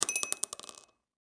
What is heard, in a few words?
Emergency Pill Drop Glass Medicine; Drops; Objects